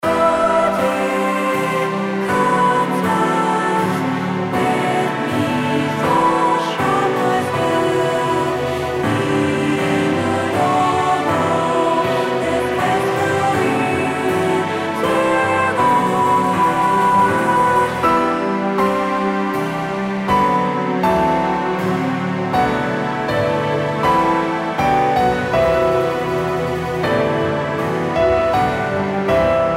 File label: BGM summon